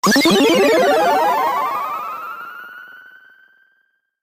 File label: Space Sound